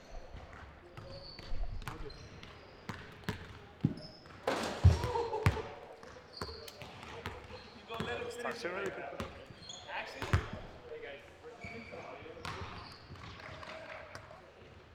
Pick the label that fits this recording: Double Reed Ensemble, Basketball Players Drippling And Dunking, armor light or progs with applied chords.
Basketball Players Drippling And Dunking